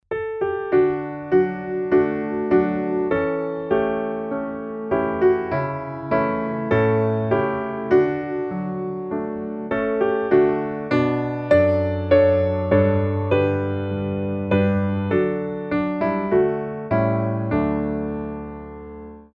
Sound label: piano